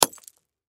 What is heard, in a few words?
Impact Clay Pot Hit Shatter; Drops; Sound Effects:Objects; Blastwave